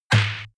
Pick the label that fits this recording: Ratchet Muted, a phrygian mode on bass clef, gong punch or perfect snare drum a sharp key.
gong punch